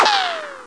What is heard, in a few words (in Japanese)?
ショット; マジカル刑事